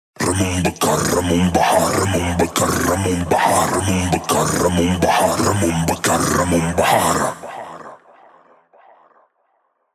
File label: 138 Shamanic Voice (Wet), Psytrance; Shamanic Voices